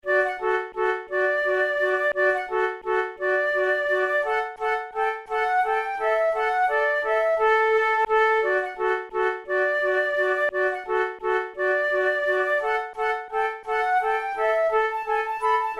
boieldieu le petit chaperon rouge laurette duet, flute